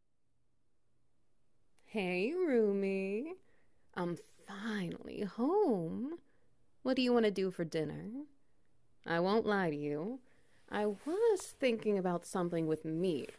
SFX Wet Noises

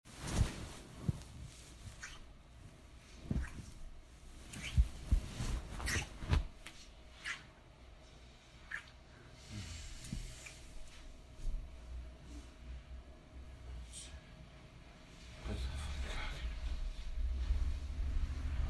Rainy Day Sex

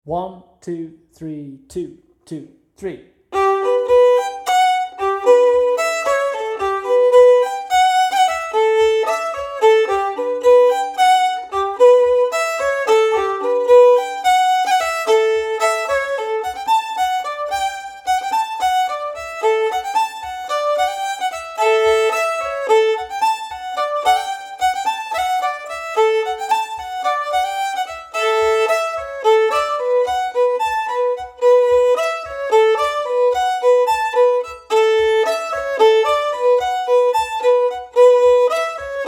Fiddle Full Speed

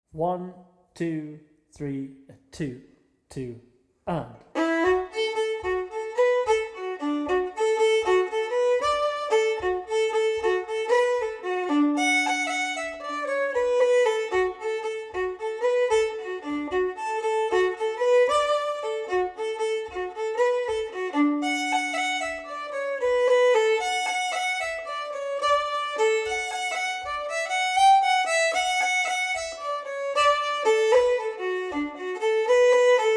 The Whinny Hills Of Leitrim Fiddle Steady

